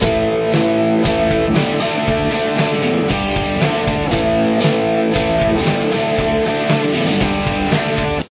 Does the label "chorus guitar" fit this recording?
Yes